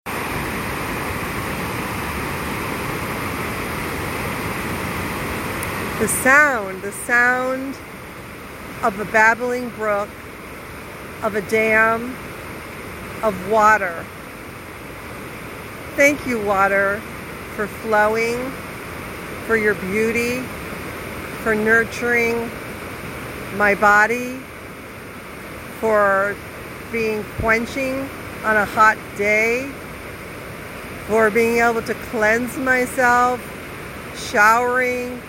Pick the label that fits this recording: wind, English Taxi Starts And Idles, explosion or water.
water